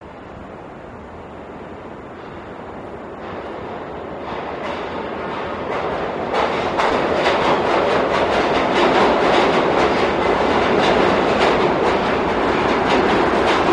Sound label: Subway Train Pass By